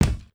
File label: imp metal crouch front; Foley; Doom